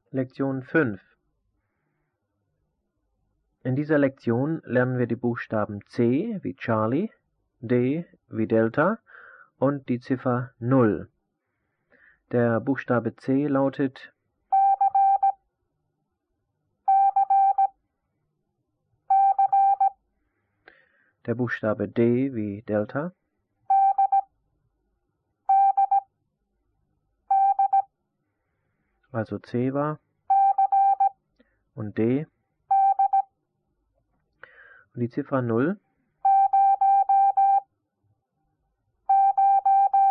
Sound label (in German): Morsen; Onlinelehrgang